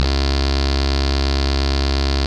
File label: laser; Republika Exhibtion Games; luftrausers